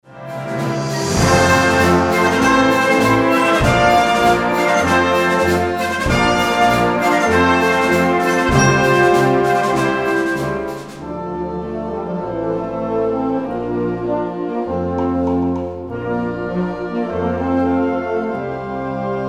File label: Fanfare